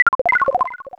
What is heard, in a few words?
freeze; mother2